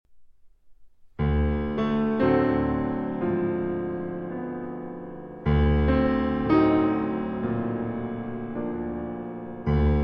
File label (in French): Sonate Pour Violon et Piano en La Majeur (Arrangée Pour Flûte et Piano); FWV. 8; III. Recitativo